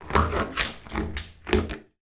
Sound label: toy suction pad movement in bathtub